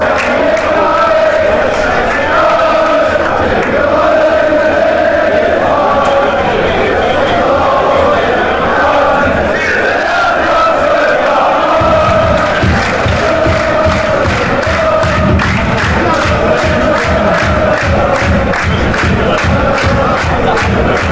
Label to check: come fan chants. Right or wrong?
Right